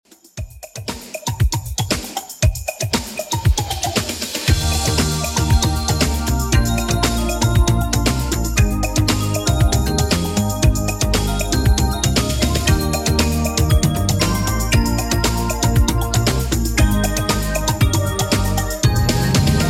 90s Redrum 117bpm

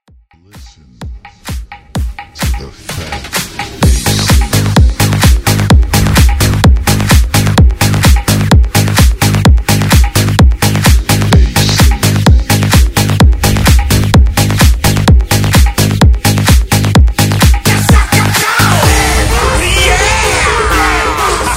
Phatt Bass Blend

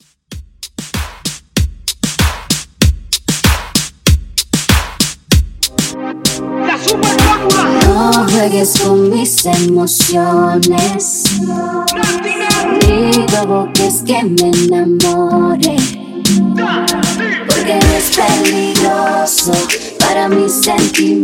Latin Dancehall 96 Bpm

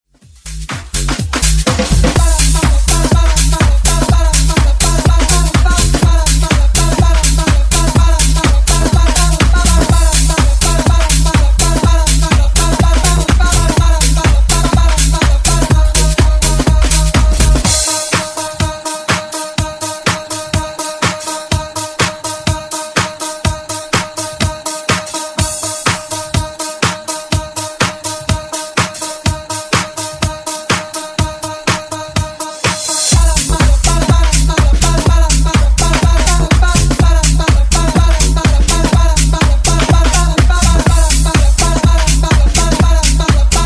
Disko Funk Remix 124Bpm

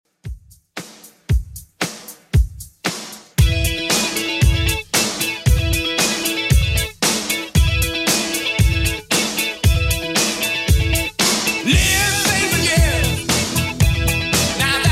80s 115bpm; Redrum

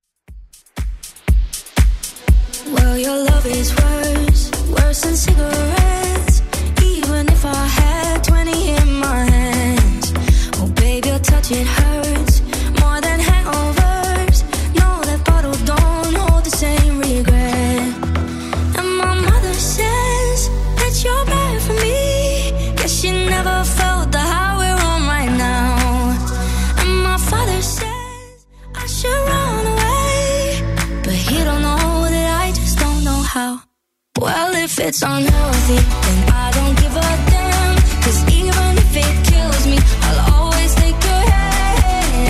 Top40 Club Redrum 120bpm

